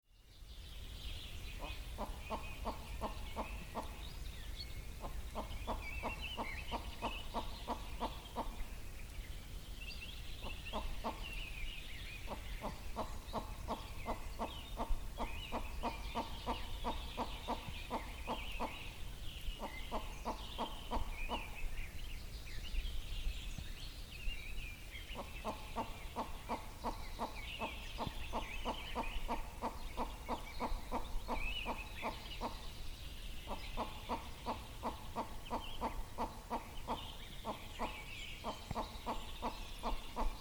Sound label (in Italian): canto gallo cedrone